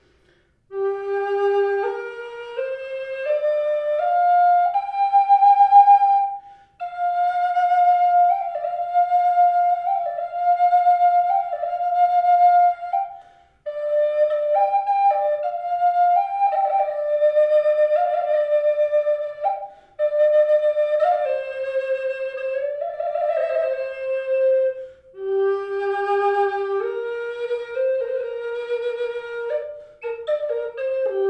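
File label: reverb, flute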